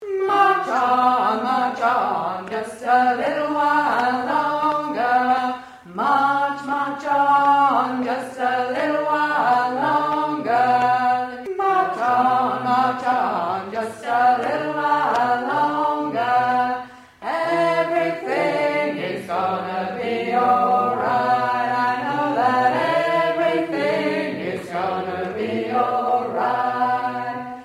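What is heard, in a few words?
alto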